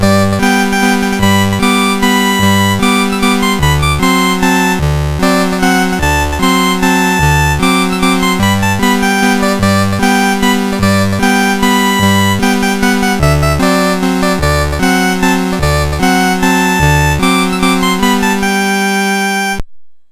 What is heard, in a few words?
La Cucaracha, MOS6581R4